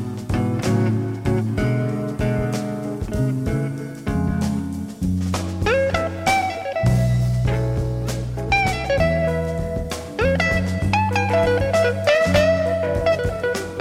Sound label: guitar